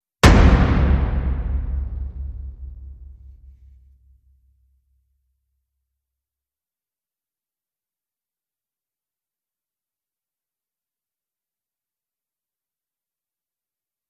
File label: Huge Hits